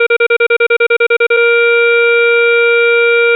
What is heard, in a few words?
locking target, jet vehicle